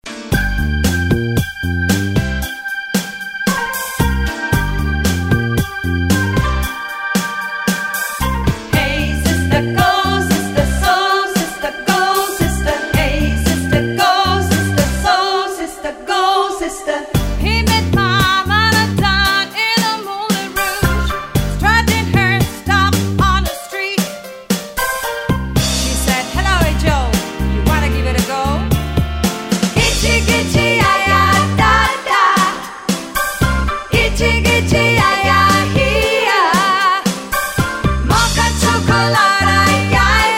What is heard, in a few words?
Female Singer disco; Play Music Swiss